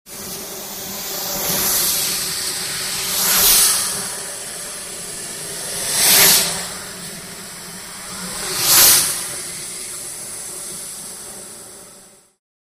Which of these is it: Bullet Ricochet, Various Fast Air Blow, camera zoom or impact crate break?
Various Fast Air Blow